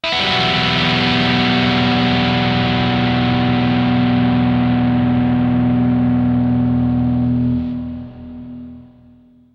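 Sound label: dirty guitar moogerphaser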